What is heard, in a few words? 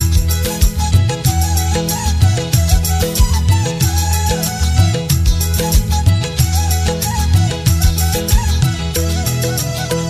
indonesian shake ringtone